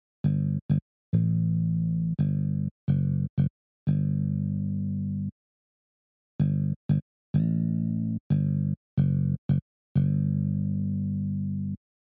bass guitar

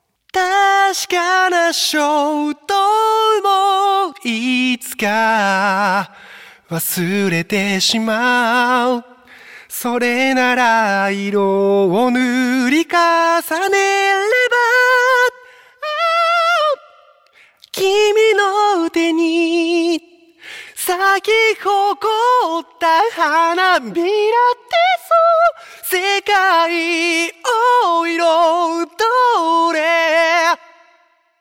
vocal after

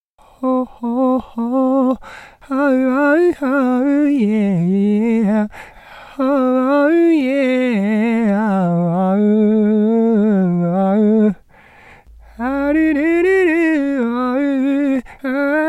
vocal

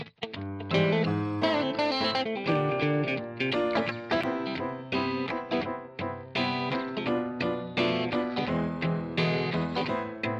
second guitar

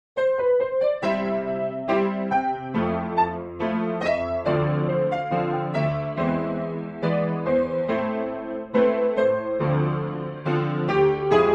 dry

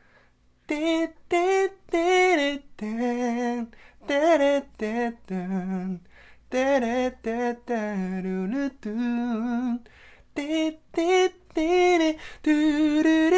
outside far

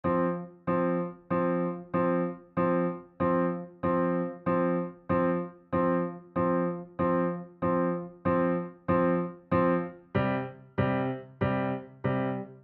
chord

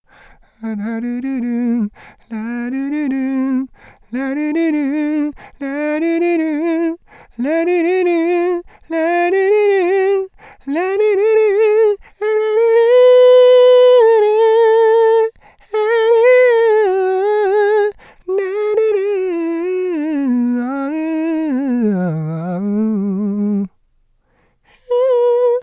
lalala

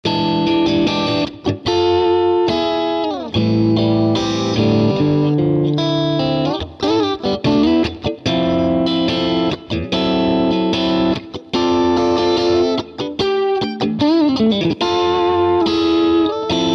guitar

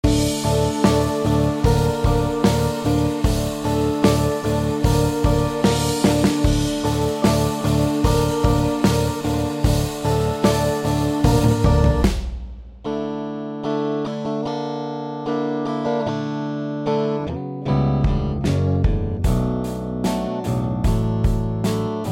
sakura mix guitar pure

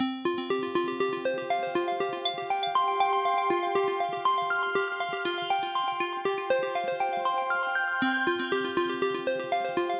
bell

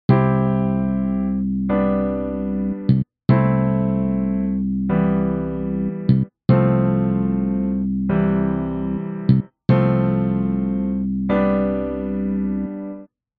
bass

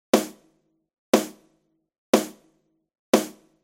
snare

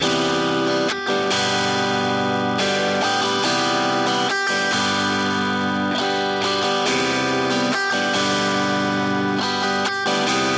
guitar mix

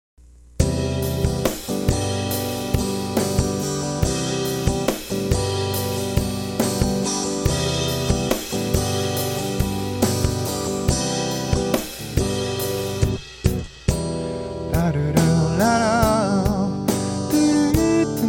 drum

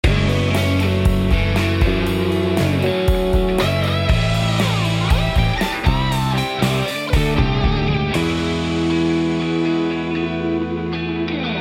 solo

